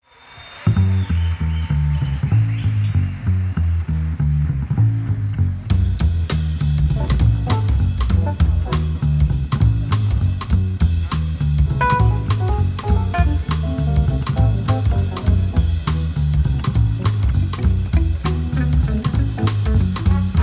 trumpet

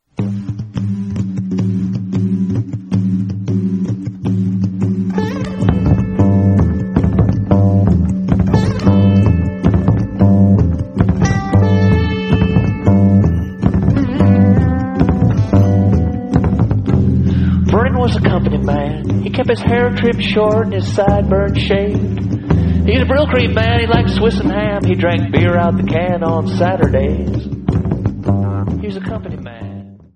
whales

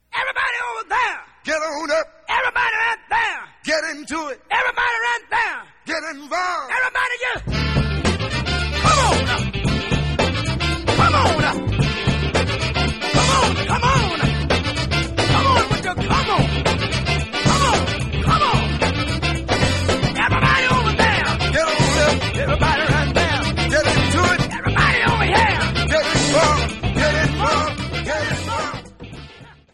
70s funk

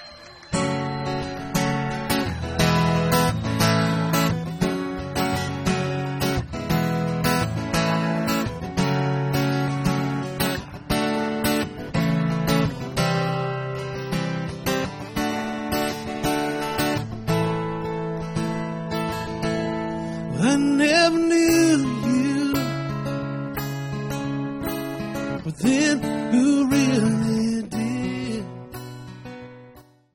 jazz

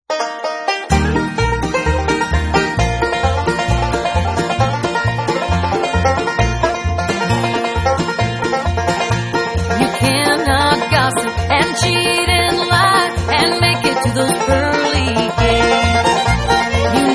bluegrass

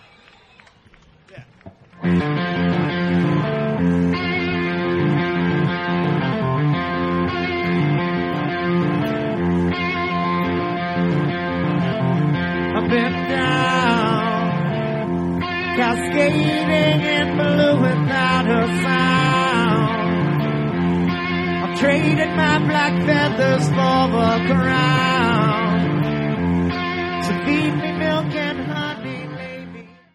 jazz

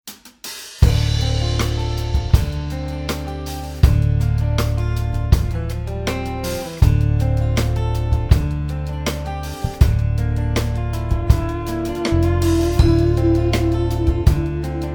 pulse

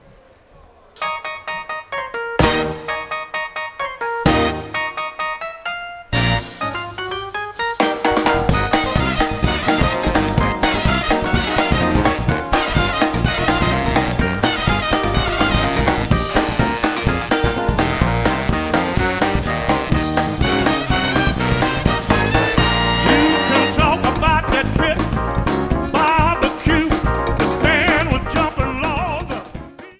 jazz blues

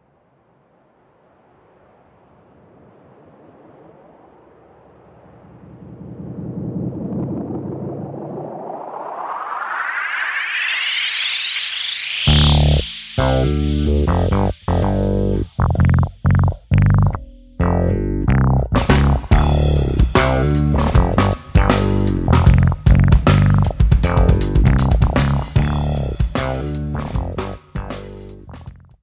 creep funk

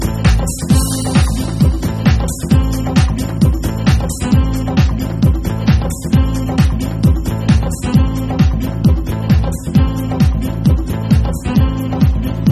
string

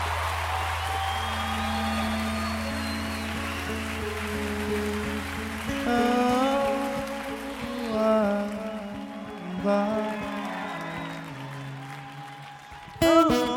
solo

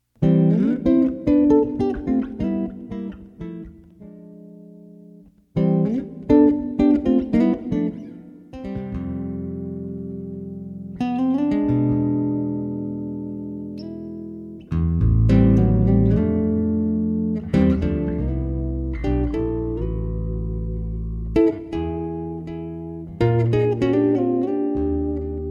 jingle

